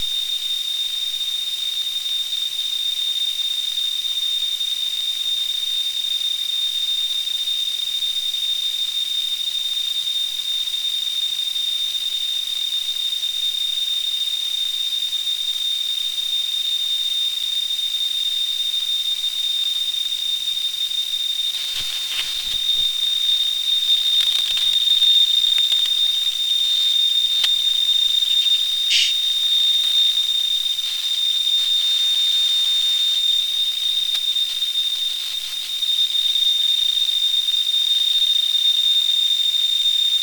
recording tone original from computer screen

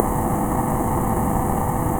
message in the peak with all harmonics removed

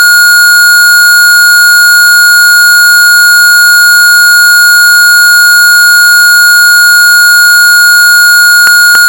tetra pulsing signal

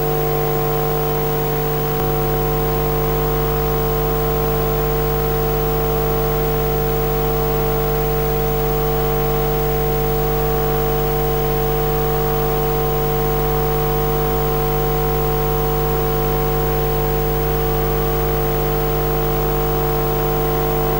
induction loop recording; This one is to show that your in-ear headphones can be used as a microphone.

